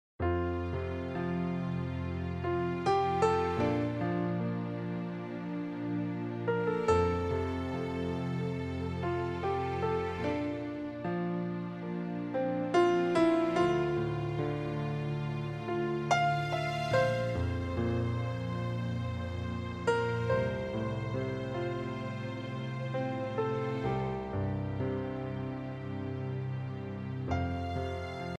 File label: Forgiveness Piano